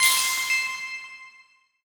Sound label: ambient game pickup score coins; esmteam